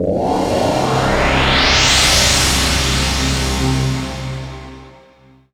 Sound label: Power Pad; Zero-G Jungle Warfare 1, 2 & 3; 90 s Sample CDs